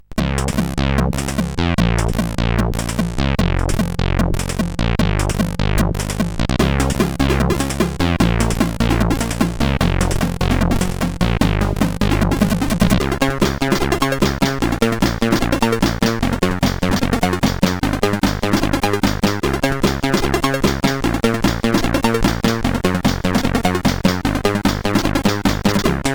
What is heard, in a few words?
Intro, sid CSG8580R5; Paradox